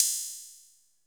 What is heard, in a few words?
HI HAT, DRUMS; Music Store Sound Service; Einstein